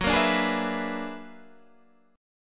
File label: B-Minor Major 13th